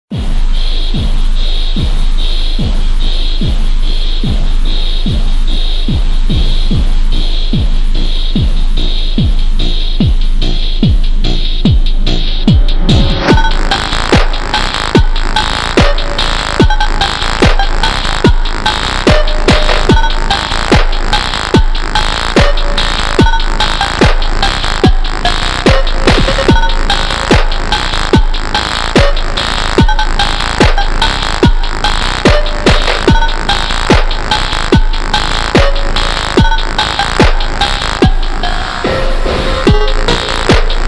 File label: ultra slowed reverb